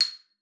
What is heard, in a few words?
C7, xylophone; Xsample VOL-4